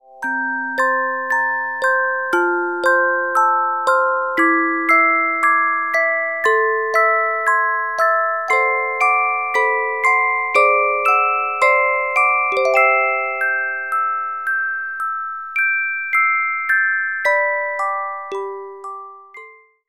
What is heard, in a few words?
Carillon Music Box Melodies